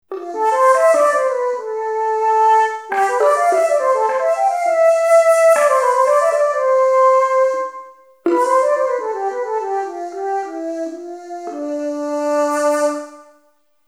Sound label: flut; wallander instruments; wivi player